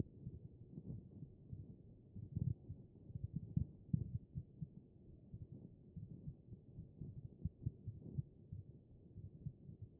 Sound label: HEART; B MODE